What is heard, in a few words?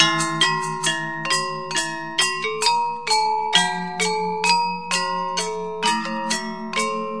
gamelan; WORLD LOOPS